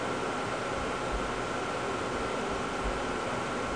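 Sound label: AMBIENCE ROOM; REDNECK; RAMPAGER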